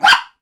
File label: animals dog jack russell bark yap; sound effects one